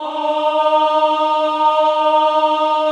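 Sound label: choir E flat 3, women; Sound & Vision - Gigapack I CD 2 (Roland)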